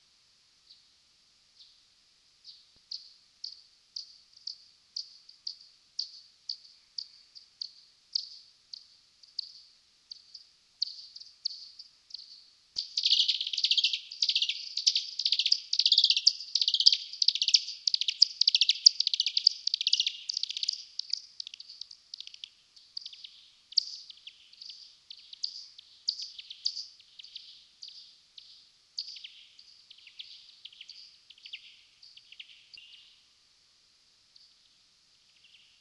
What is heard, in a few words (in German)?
Bartfledermaus sozial